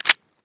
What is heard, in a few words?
ump45 mag in, weapons; garrysmod; ump45 cstm